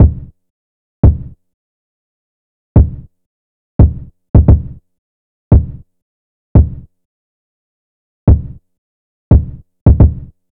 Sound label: Drum Loop - 87 BPM Kick, Boom Bap; Frozen; Cymatics - ANALOG EVOLUTION Beta